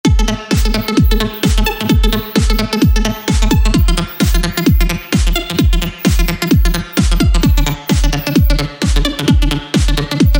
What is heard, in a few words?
android dance